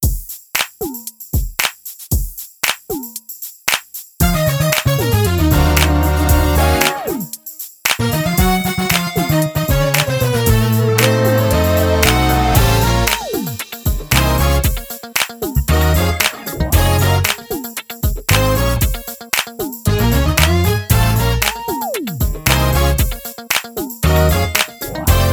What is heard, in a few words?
Gospel No Drums 115bpm; Groove Jammer